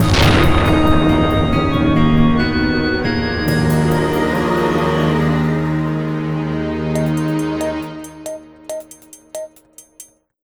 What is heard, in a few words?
Jingle Death; Asterix Obelix XXL 2